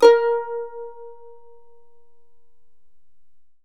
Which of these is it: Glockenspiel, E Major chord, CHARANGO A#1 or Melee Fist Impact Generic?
CHARANGO A#1